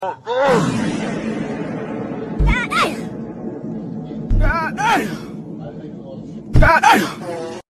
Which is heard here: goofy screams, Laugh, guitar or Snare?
goofy screams